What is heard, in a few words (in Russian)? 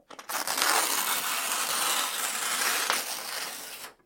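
разрыв бумаги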